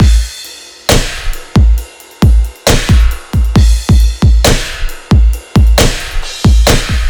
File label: The Dome Kit B; Барабаны